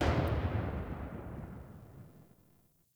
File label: Turret Loop End; weapons; Lasrian APC; Warframe